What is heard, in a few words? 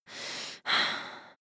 breath female slightly aroused